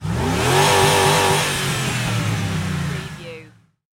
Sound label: Truck 4.7 Liter Rev Engine Perspective